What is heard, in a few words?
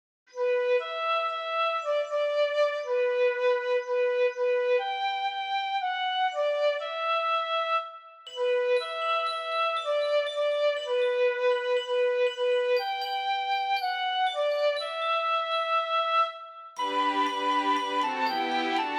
Karaoke Ko e Ongoongo Lelei